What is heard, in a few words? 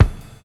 thumpy bass drum F# key; 60s; kick drum; F sharp